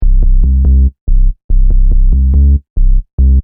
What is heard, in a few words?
Techno Trance Sub Bass Loop In C 142 Bpm